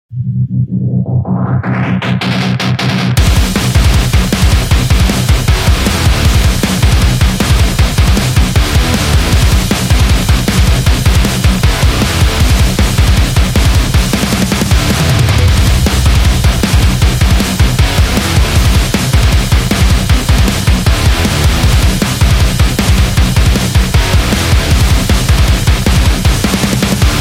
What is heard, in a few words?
Martial Arts